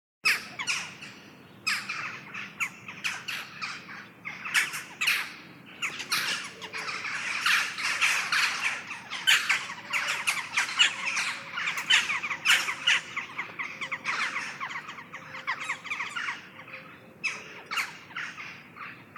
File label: jackdaws; best service Blue Box